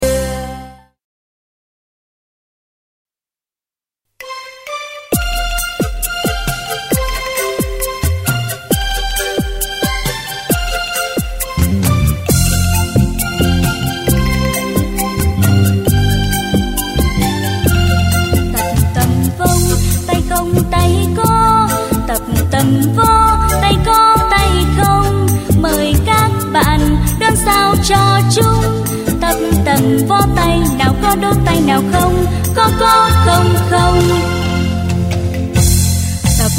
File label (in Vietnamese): Bài Hát Thiếu Nhi; TV - Bài Hát Thiếu Nhi lớp 1-5; tap tam vong